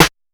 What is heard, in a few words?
accent snare